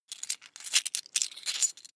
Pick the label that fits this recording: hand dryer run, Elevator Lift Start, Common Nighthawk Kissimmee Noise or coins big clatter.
coins big clatter